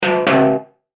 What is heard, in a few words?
Chime Percussion; Web Interactive; Technology; Multimedia; Musical